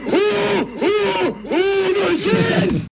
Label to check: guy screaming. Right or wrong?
Right